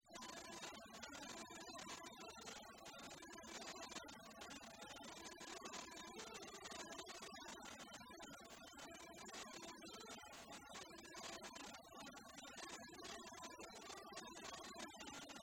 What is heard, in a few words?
bagpipes